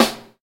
smooth snare a sharp key, snare single hit tuned to the A# note. Loudest frequency: 2066Hz; snare drum samples